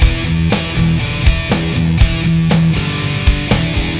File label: METAL